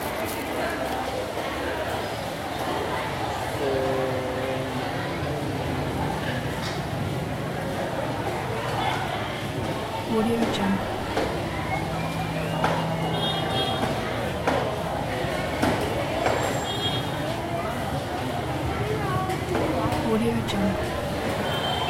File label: ambiance market indoor vietnam mui ne loop